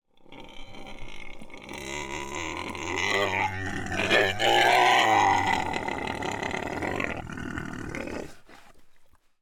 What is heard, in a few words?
Pig Squeal; Coll Anderson